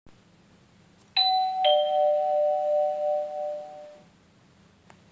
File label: Ding Dong